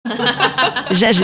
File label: hahahaha